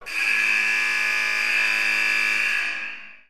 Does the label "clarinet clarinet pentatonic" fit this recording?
No